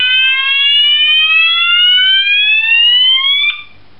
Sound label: dutch fire slow whoop, fire alarm; garrysmod